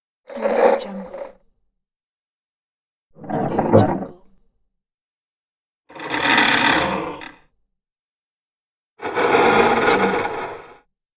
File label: furniture moved